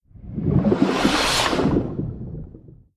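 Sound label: howler monkey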